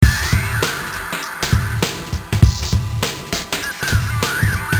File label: depressing rock music